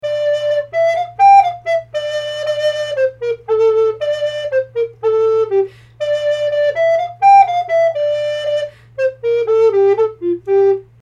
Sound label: Scottish B only; bransles